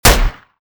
vishr fire; STALZONE

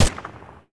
SKS silencer; STALZONE

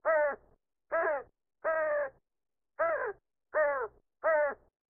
Small ambient crow; STALZONE

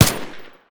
lr300 silencer; STALZONE

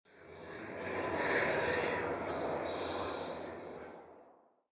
moan; STALZONE; minecraft

